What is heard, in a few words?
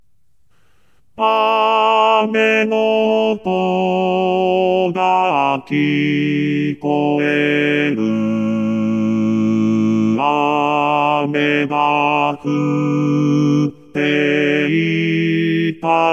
bass